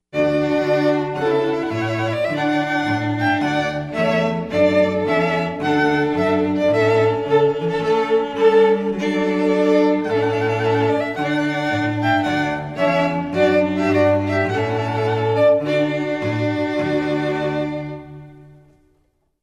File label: TRUMPET; CLARKE